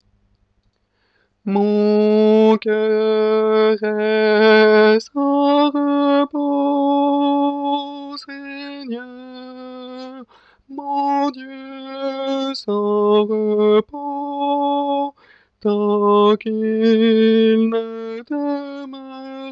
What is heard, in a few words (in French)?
tenor; Plus pres de toi; mariage